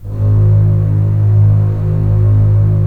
STR, Roland LA Composer

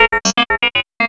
POLYMOD; AMG - Vince Clarke Lucky Bastard

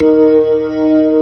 MID SLOW D#3, ToneWheel Organ; E-MU Formula 4000 Series Vol. 3; Analog Odyssey

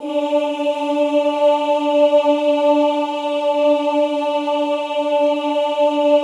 OOH D3, Classical Choir; Best Service; Extended Classical Choir; OOH MIX

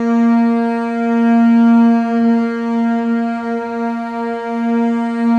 Contrabasses A#2; Best Service ProSamples vol.51 - Classic Orchestra 2

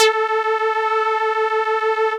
lead syn, Techno Trance

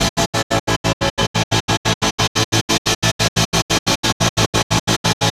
Noise Pattern; Sonic Foundry (Sony Creative Software); Hydroponic Hip-Hop

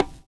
PERCURT; Univers Sons - Kitch 70s

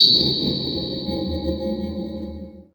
HITS, Special FX Twisted; USB Soundscan; AKAI

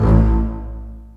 string; Techno Trance Essentials

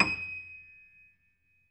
piano; East West; The Ultimate Piano Collection

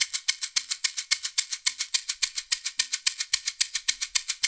AFRO, African Contemporary, AfroTriangle

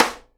SIDE STICK, Live Snares

